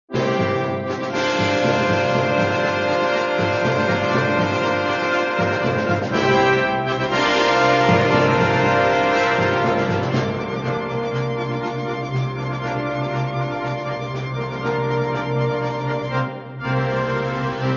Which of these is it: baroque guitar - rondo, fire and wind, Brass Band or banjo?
Brass Band